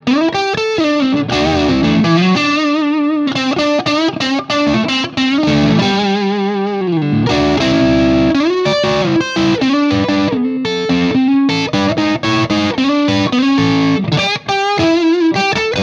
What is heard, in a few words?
Blues Lead